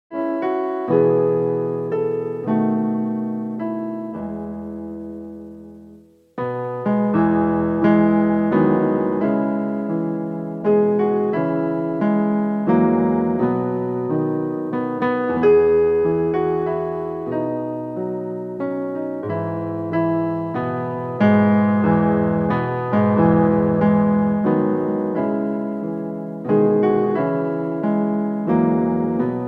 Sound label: Star Spangled Banner ACCOMP